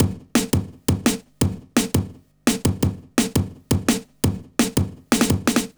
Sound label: 170 break, Explosive Jungle; USB Soundscan vol.05; AKAI